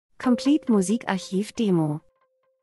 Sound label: transition; Transition & Movement - hell Delay mystisch